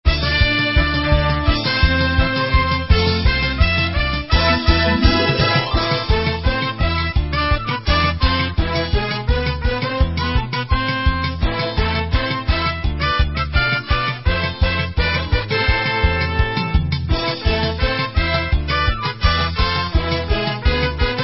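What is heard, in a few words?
Hora Instrumental, Israeli Dances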